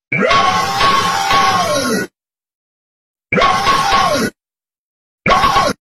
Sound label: Raaaaawh screaming skeleton